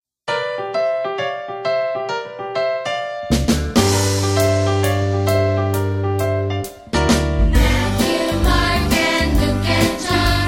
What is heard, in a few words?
Vocal; New Testament